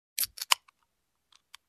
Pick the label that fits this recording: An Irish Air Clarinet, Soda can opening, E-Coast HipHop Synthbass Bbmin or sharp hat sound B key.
Soda can opening